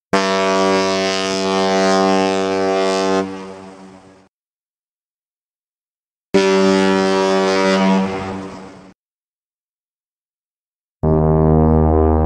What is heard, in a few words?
bocinas de barco efecto de sonido boat horns sound effect